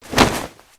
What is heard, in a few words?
cloak